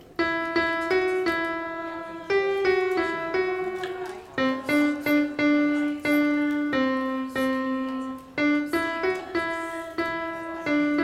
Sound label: alto refrain; Gather Hymnal